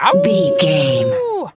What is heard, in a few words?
wolf howl